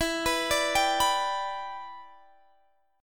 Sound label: G Major E; arpeggiated